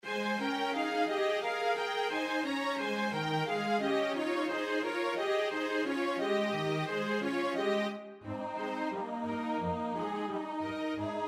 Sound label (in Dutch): tenor 2; Poulenc (Francis); Stabat Mater